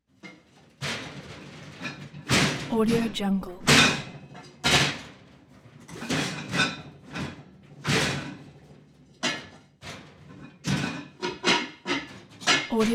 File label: kitchen chaos dish shelf; collanderson